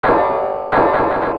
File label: Iron Knuckle Crumble